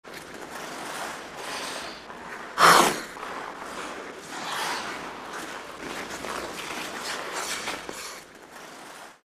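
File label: Hockey Players Skate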